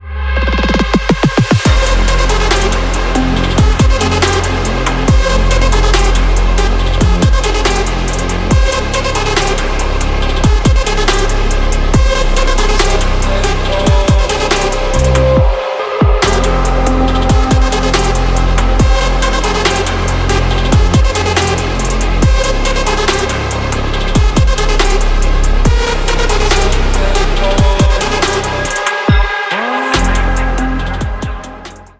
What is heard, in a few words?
glyphtone, phone; create custom glyphtone for nothing phone 3a; apogee